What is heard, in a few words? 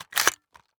lr300 charging handle back; Rust